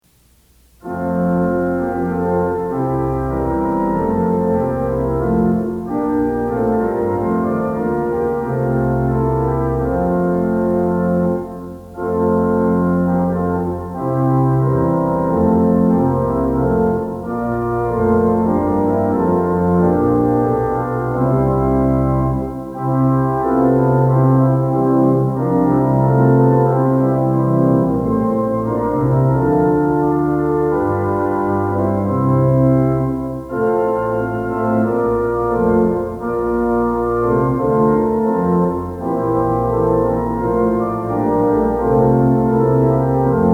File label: Organ Music for Worship Services; Praise the Lord! Ye Heavens Adore Him